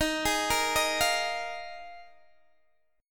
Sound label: Eb Suspended 2nd Suspended 4th, Guitar, DADGAD